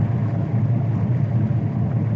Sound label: Ship 2 Engine Back; DCS